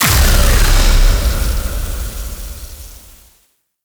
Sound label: Gate Explosion; The New War; Ambience; Narmer Outpost